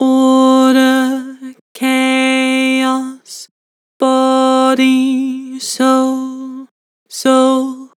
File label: Vibrato, Vocals; Order and chaos; Harmor Vocals